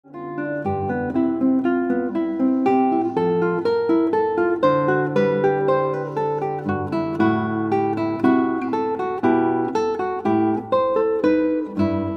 Guitar

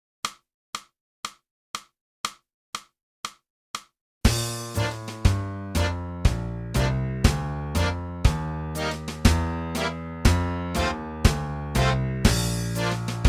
sax

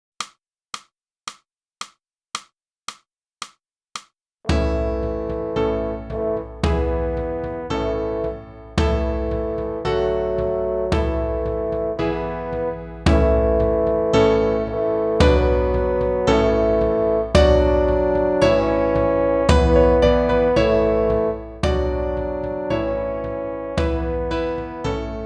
flute, nkosi sikelel iafrika; (Morgan) - Boosey Woodwind Method: Flute (Book 2)

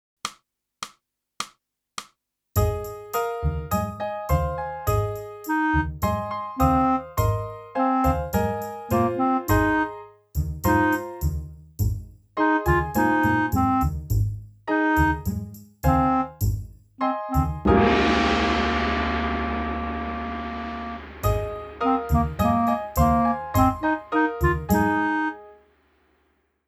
clarinet; boosey method

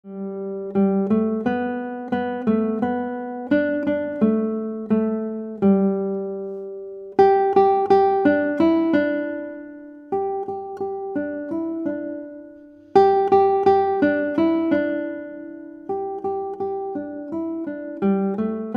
Guitar

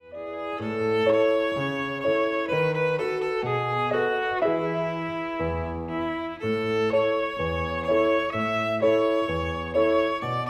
VIOLIN

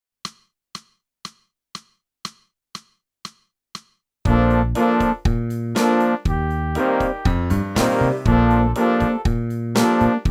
clarinet; boosey method

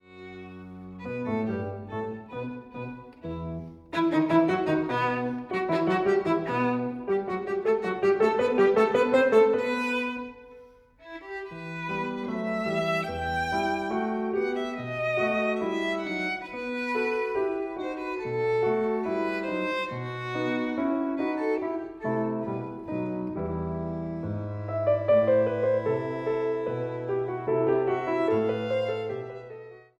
VIOLIN; Trinity College London violin exams for 2016-2019; Mozart: Allegro (1st movt) from Sonata in E minor K.304

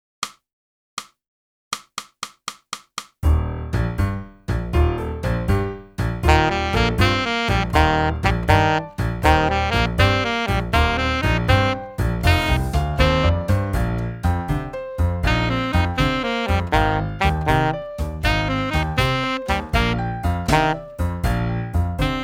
sax

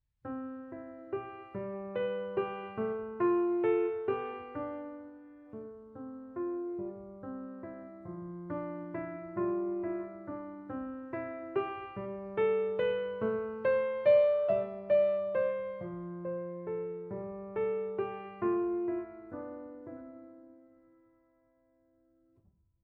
piano

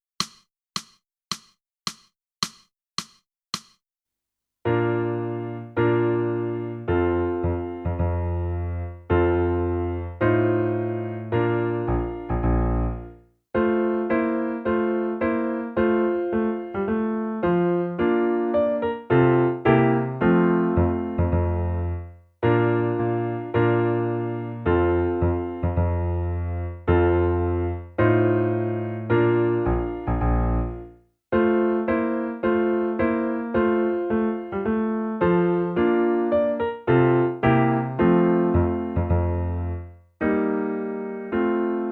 clarinet

